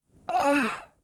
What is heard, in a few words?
groan; Pacifica